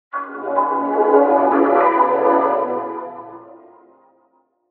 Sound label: in Ives, Vocoder